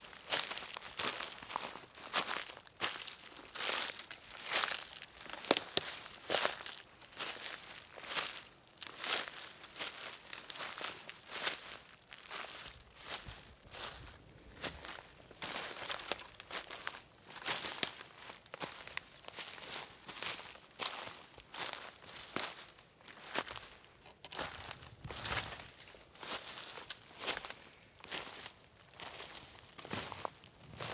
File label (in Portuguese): Passos na folhagem seca e alguns galhos